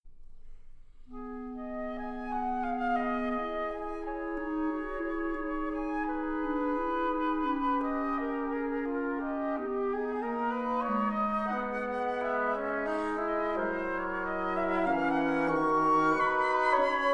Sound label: TIMPANI